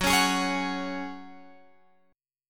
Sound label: Gb Suspended 2nd, Guitar, Open F; strummed; x,13,13,15,16,13